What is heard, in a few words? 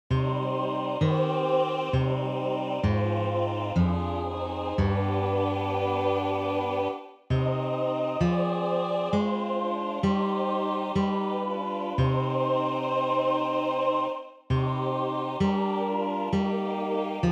ps 31 basse; Psaume 31